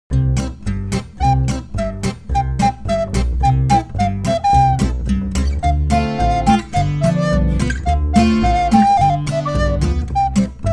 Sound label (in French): Instrumentale